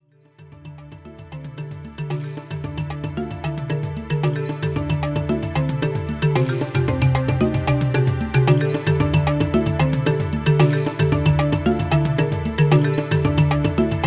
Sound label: Desert; albion